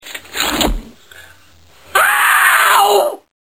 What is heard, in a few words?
zipped